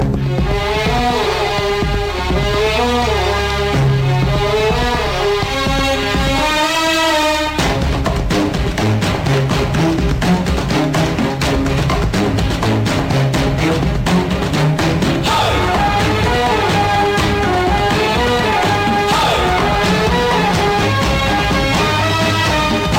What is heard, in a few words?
trailer; pudhupettai